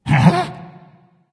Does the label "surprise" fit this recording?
Yes